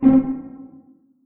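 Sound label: string pizzicato C3 pianissimo left; Sound On Sound - Peter Siedleczek's Orchestra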